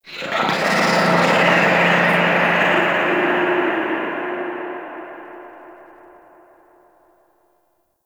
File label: Moon Whispers Medium; Warframe; Orokin Moon; Ambience